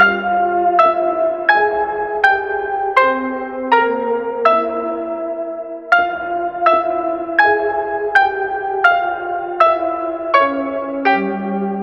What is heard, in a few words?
piano, Loops Sad